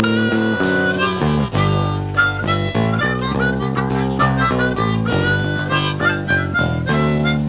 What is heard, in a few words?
monkey blues